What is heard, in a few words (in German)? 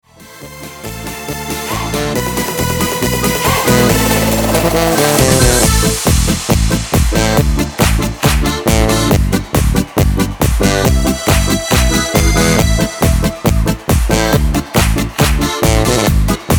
C volkskaraoke ohne Chor